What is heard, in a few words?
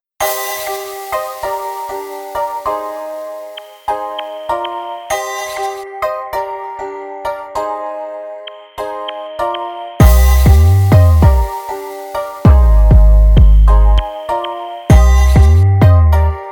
Instrumental